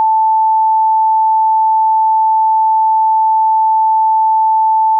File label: sine wave